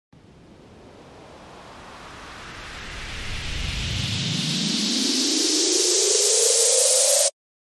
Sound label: build up